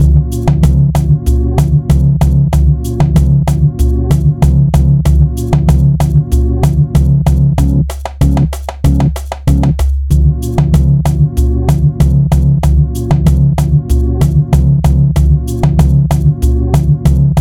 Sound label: Interlude